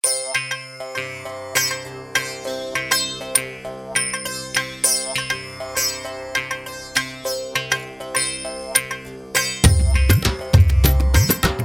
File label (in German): indischer Beat, ohne Tverb; Eventide Tverb